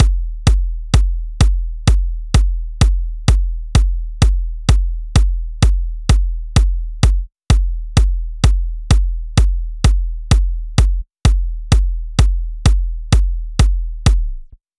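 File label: Drum Loop 128BPM bass drum; VEC5 Drumloops; VEC5 Multi Loops 128BPM; Loop Elements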